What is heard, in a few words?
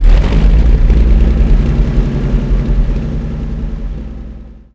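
cromwell engine interior load; cannons; War Thunder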